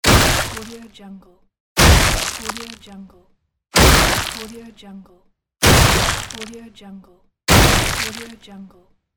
gore explosion

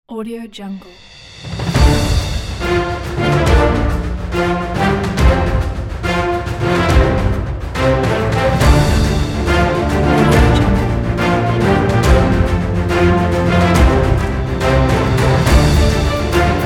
pirate epic

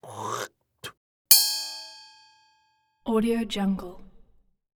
spittoon spit

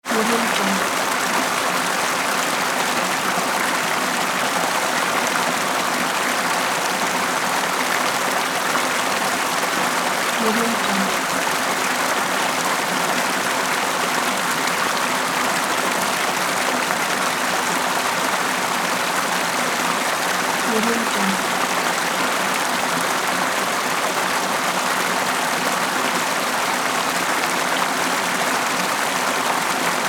calming water stream

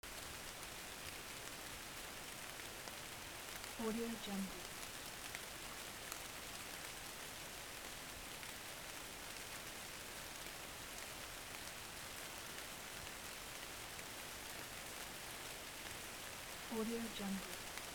rain; dedge studio

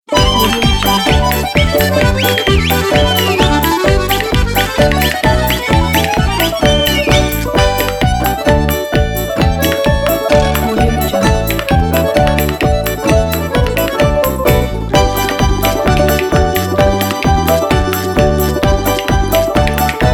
balalaika russian

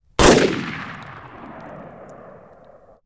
ar15 rifle; prosoundfx

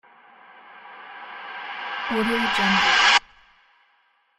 rise

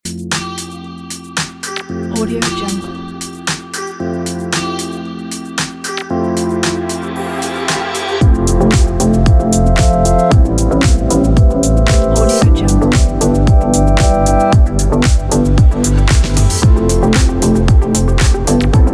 fashion summer lounge kit

